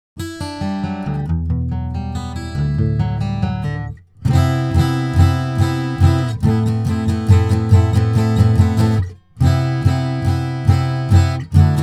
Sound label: Acoustic; Stealth XL